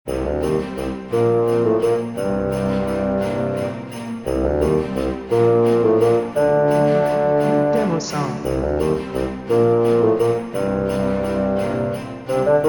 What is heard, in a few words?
Loop